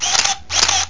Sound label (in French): declic